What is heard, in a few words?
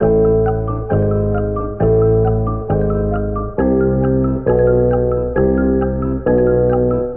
keys clockwork runs out; Loops; Phonky